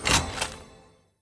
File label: draw; valorant; phantom